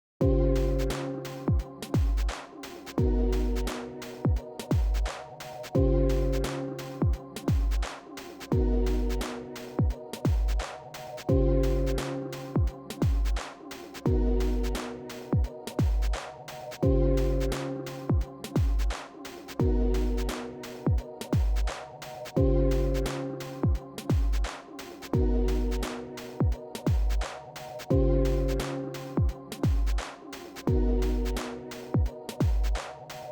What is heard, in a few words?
game over